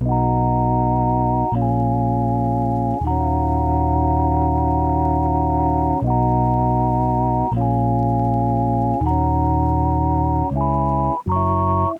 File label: Lofi Melody Loop - 80 BPM D# Min; Cymatics; 2020 Melody Collection